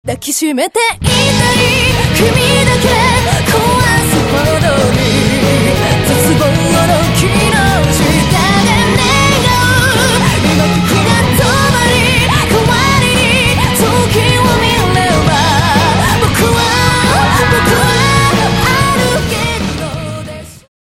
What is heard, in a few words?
Orchestral Fantasia